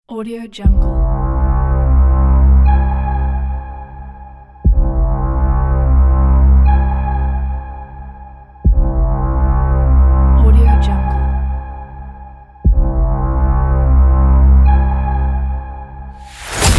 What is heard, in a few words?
discrepancy cinematic trailer